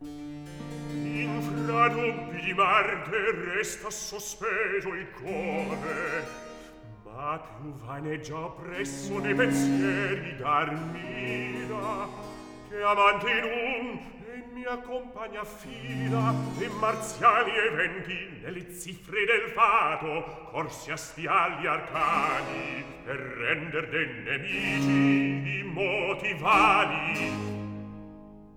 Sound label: Recitativo; Infra dubbi di Marte; Handel; Rinaldo; Act 1